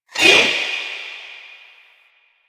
Choir Erupt Proj By; Warframe

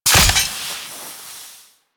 Four Barrel Rifle Mech Empty Fire

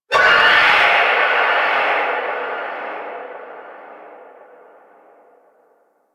Stadium Infested Medium; Warframe; Ambience; Nineteen Ninety Nine

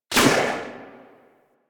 Pistol Shot B; Bard

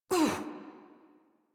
Pain Adult; Warframe; New War; Adult Operator

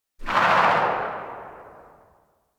Tail, Pistol; Weapons; Grineer; Amphis

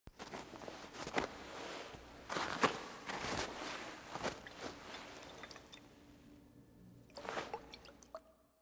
Intro Foley Enter; The War Within; Operator

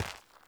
Walk Gravel, Footsteps; Characters; Enemy; Mockeries

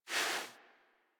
Kubrow Foley Idle Paw Swipe; Enemies; Warframe

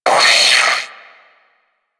Sentient Brachiolyst Pain; Enemies; Barks

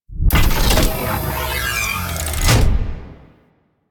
Turret Harness Underneath Unequip; Warframe; Vehicles; RailJack; RailJackTurretHarness

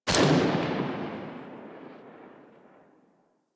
Tommy Gun Tail; Weapons; Warframe; Tenno

